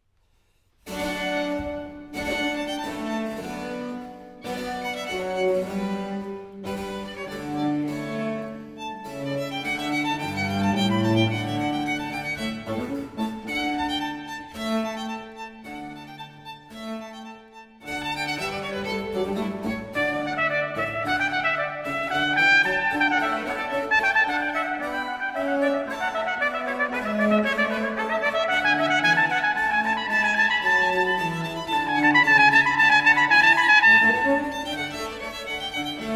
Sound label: Violin Concerto in D Allegro; Vivaldi